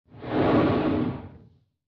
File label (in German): schaden; Barotraumatic; Hornshrimp